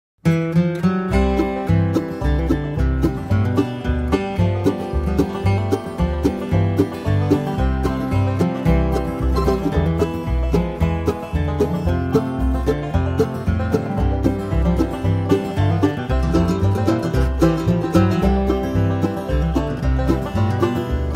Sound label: freight train lonesome whistle, Музыка на тему железных дорог в жанре кантри